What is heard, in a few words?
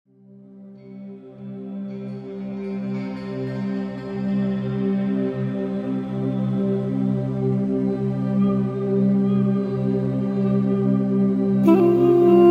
Persian Flute